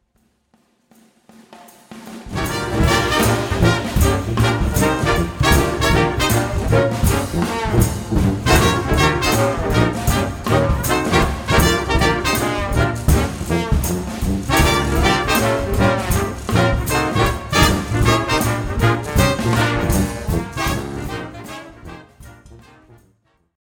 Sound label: sanctified blues first chorus